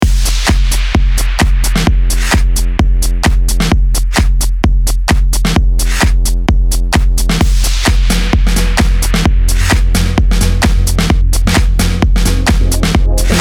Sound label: Moombahton Transition 130-108